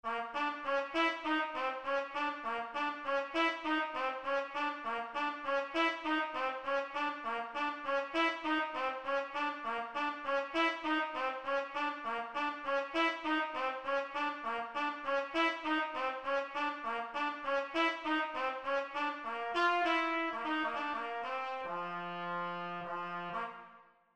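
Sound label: Powerhouse Trumpets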